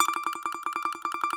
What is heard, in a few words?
BAGLAMA T1DL; E-MU Producer Series Vol. 7 – Old World Instruments